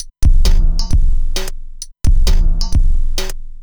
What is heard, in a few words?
HiHat Perc Loop, Dance Beats